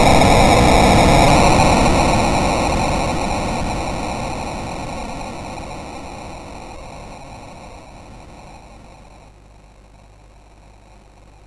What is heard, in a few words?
MOS6581